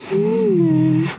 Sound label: inspect